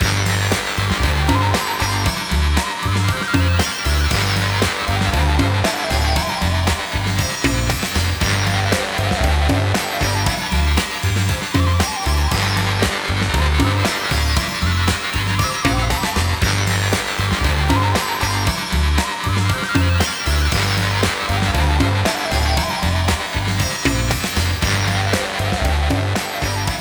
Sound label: Picker Music; Fibbage 3; Jackbox Games; The Jackbox Party Pack 4